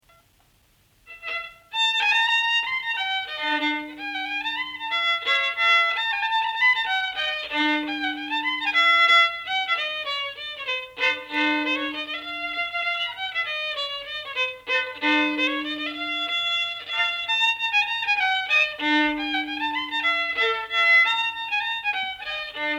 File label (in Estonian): Kockleiken Kokalugu viiul folk; Ruhnu; Elias Schönberg